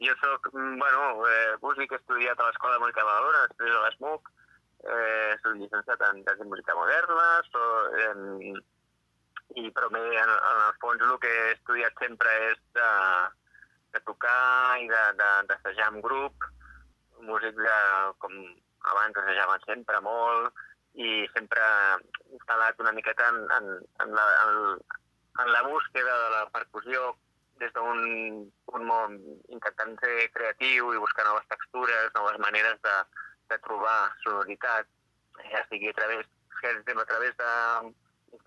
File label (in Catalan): quartet percussió